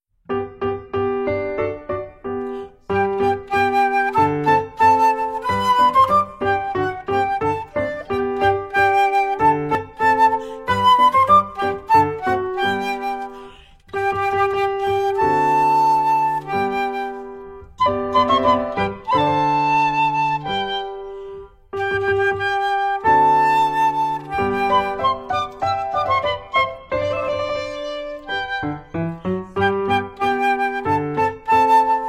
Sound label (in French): Sur le pont d'Avignon Instrumental